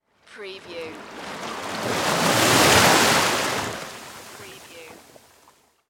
Ocean Waves Crash

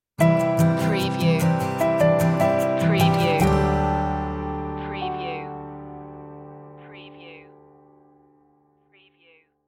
stinger

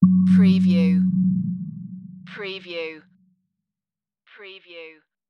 Hnted Gong

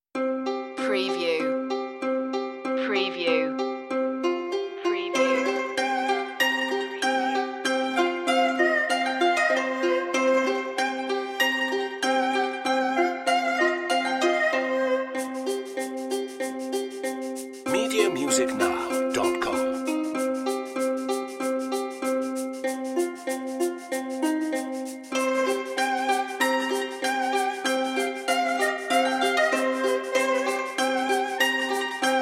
no drums no synths

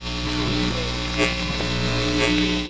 Electric High Voltage

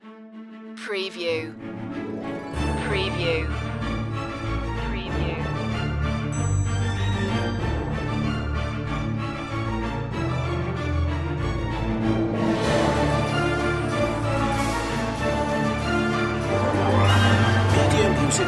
no choir

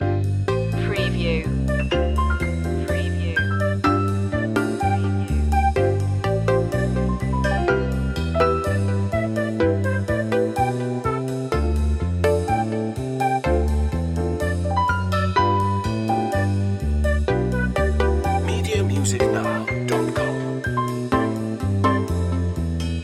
carefree day out loop

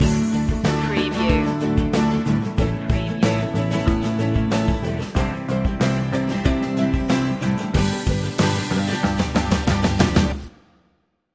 positive days stinger

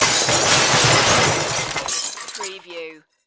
Junk Crash; Action, Impacts & Explosions

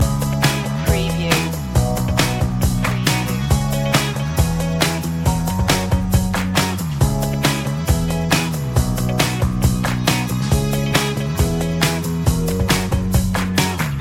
freaky ride loop

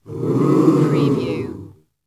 Crowd Ooo

